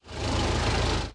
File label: Forklift Car Moving; subway surfers city sound effects; Android, iOS